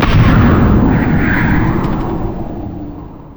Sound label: yasuo base quickdraw wind; LoL